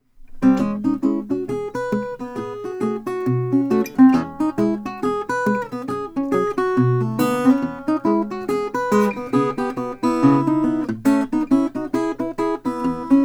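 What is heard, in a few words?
gretsch hawaiian dlx sunburst; 1999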